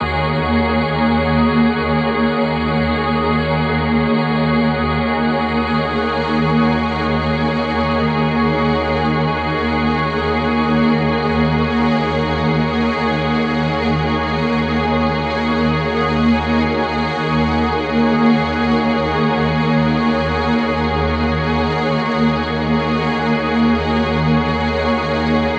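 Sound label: pad loop sustain 150 D# minor; Virtual Riot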